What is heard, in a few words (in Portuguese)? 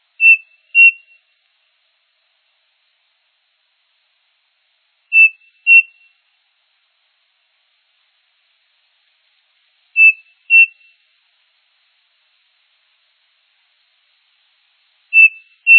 saci